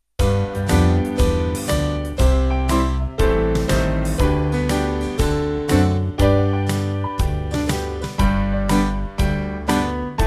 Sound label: Band, Free Music Backing Files for Churches